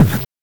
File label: BANK1 SD, NES DPCM; MIDINES